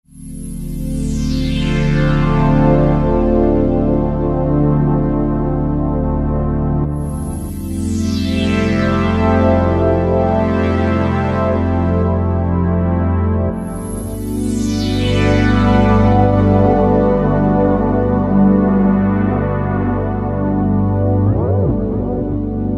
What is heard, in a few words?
Roland V-Synth; Resonus; Factory Patches